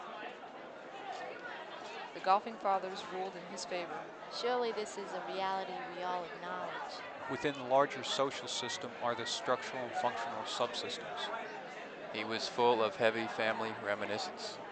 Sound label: noisy babble; Noise Power Spectral Density Estimation